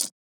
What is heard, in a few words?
hh, Hats; drumkits; routine; fl studio; goodtakimu drumkit